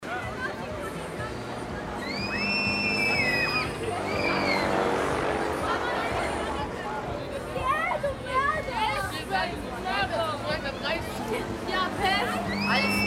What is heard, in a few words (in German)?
Rummel Menschen Schreie Grosses Pendel